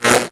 BlueFly Hit; Eft

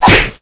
MONKEY BOXER attack

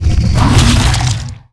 BLACK BEAR Attack

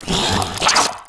Pelicanodon ATTACK; Eft

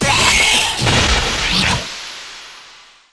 Vine Die; Eft

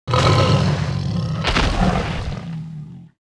india cannon die

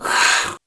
attack; Eft; Dead Messenger

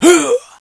hit; Eft; Gold Beetle

